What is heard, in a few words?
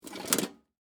Lunch Box Pick Up, Foley, Lunch, Box, Pick, Up, Metal, Case; Pick Ups; BlastWave